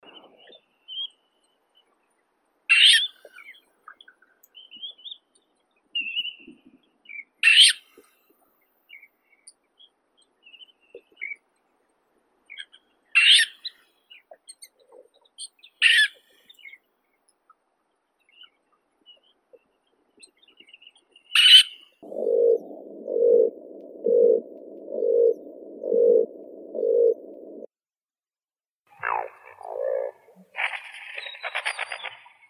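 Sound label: Asian dowitcher, Limnodromus semipalmatus; veretennik bekasovidnii aziatskii; zvuki prirodi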